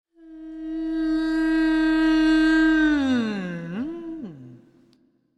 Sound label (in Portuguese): voz boca fechada em ondas